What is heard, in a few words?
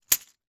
foley tokens plastic in plastic packaging drop don on hard surface